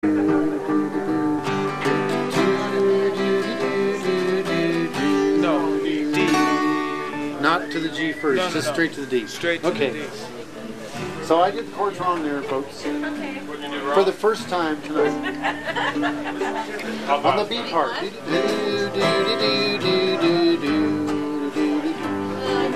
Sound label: Waltz chords; Larrys